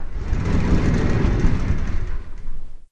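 Shower Door Glass, Shower Door, Glass; Slide Fast With Roller Bearings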